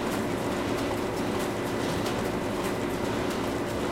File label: rocket reload loop, tanks; War Thunder